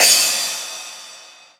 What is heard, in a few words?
crash